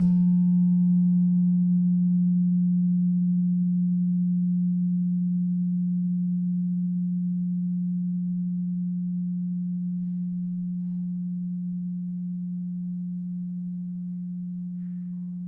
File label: Slentem 3F Sharp, gamelan tuned